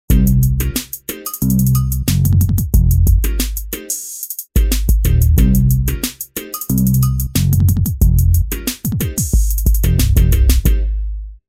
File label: Hip Hop